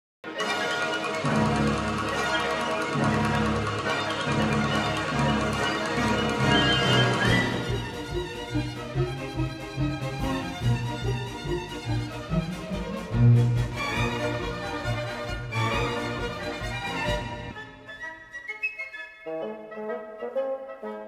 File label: Floor Music; Bronze 2022